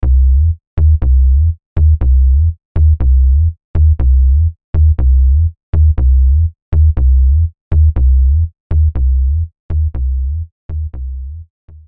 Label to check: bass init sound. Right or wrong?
Right